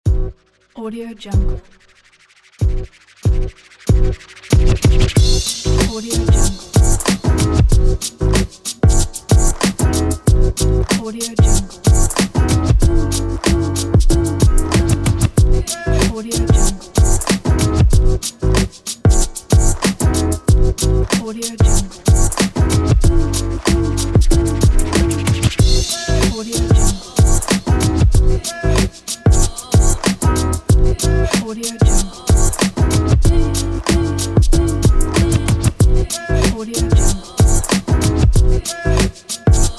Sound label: boom bap hip hop